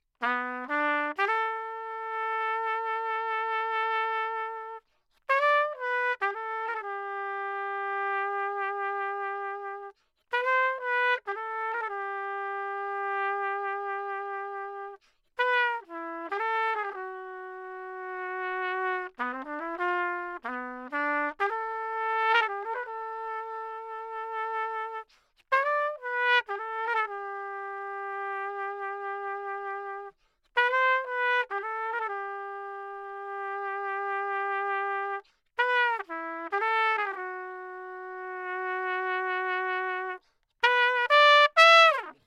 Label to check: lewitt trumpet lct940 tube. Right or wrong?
Right